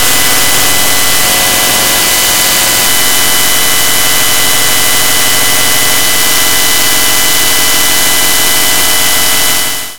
noise

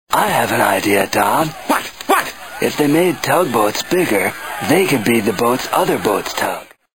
tugboats

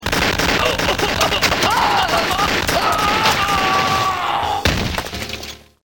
GUN

